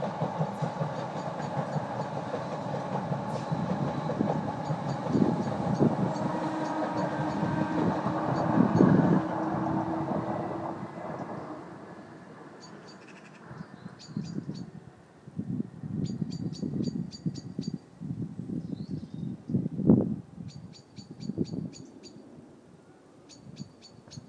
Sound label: train and evening birdsong